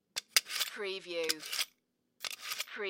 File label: Knife sharpener, sharpen kitchen knife